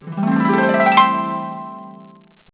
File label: long harp